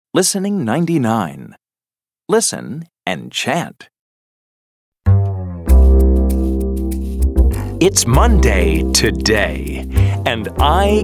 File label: Chant; Family And Friends; Family 3